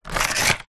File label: leisure rc toy car wheel turn motor plastic